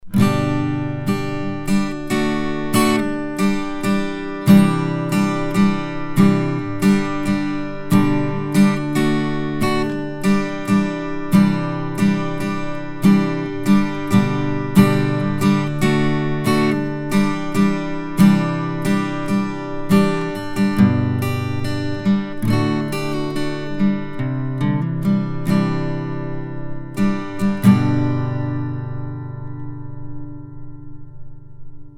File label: Acoustic Guitar